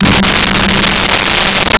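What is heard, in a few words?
Jazz Guitar G4; Nintendo; July 2020 Gigaleak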